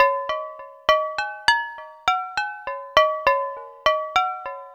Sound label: Struck Glass Melody